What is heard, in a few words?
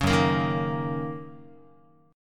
Gb 6th Gb down Guitar; Listen to Gb6 strummed